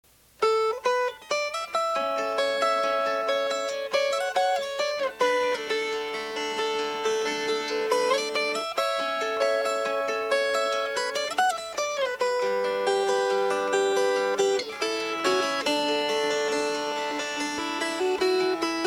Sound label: Fingerpicked; Fair and Tender Ladies